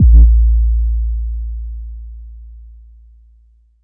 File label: 808 A; Abletunes